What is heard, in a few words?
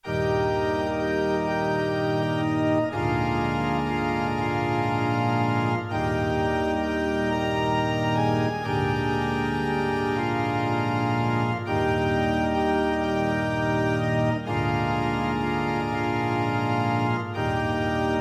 evening hymn arrange